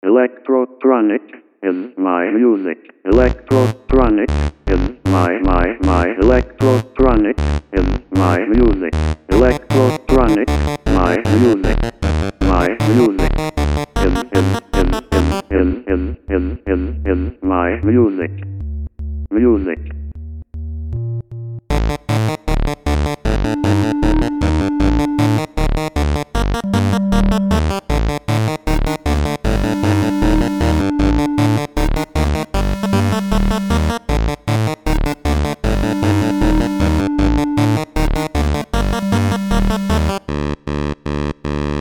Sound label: electrotronic